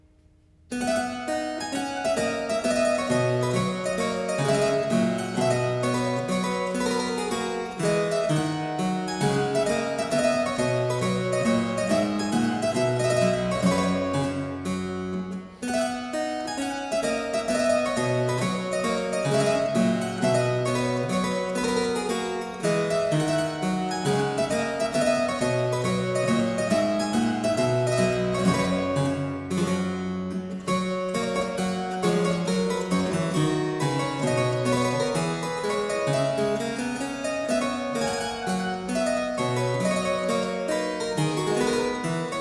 March, harpsichord; Complete Chamber Music; Brilliant Classics; Miscellaneous pieces for the harpsichord

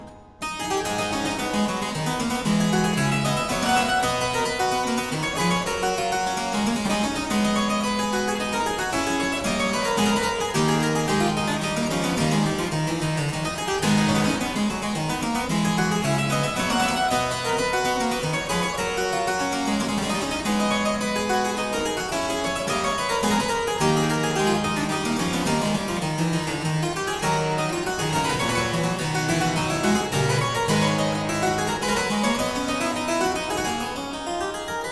Courant simble in A Minor, Harpsichord; BuxWV 245; Variatio 6; Buxtehude; Opera Omnia; Harpsichord Works

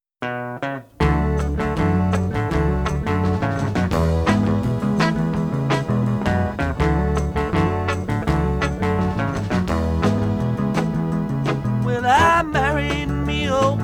with Vocals

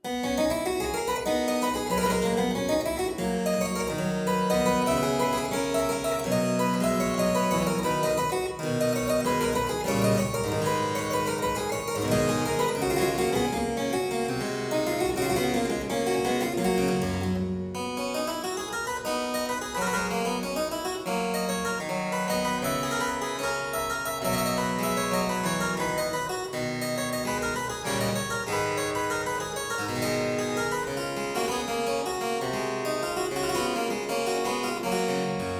Aria in C major Variatio 4, Harpsichord

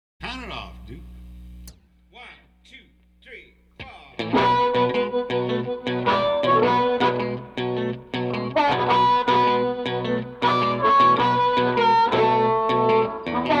B March with Harp; harmonica; Blues Harmonica Masterclass

